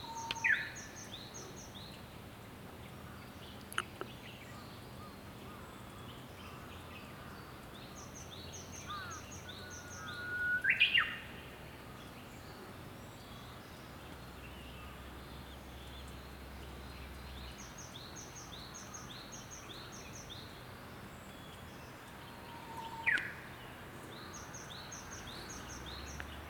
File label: uguisu